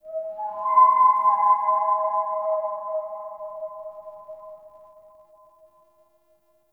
Sentient Lair Texture Interior Small, Ambience

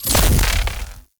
Shield Enemy Impact

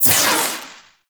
Proj Tap Whoosh; weapons; Warframe; The New War; Omicrus

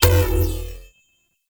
Sphere Small Power On; Warframe; Entrati

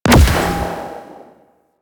Space Fighter Plasma Shot C; Space Fighter Plasma Agent; Weapons

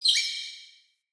Vocal Single Squeak, Animals; Player; Player Floppet

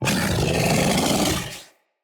Kubrow Feral Vocal Alerted

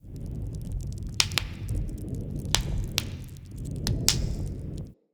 Smithy Forge Fire Crackle; Soul Plane

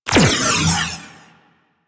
Space Fighter Railgun Fire; Space Fighter Charge Agent; Weapons

